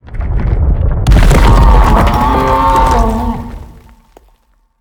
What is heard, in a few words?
Deathworm sound